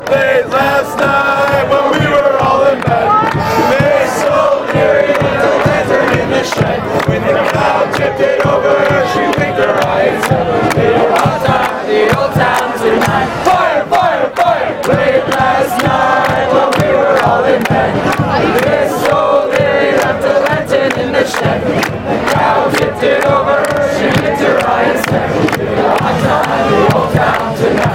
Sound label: hot time old town tonight fan chants